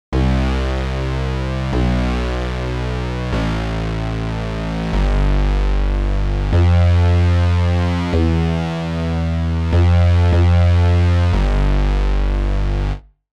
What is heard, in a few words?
bass